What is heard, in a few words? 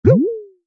ring miss